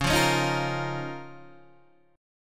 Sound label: C sharp 6th Add 9th C sharp down Guitar DADGAD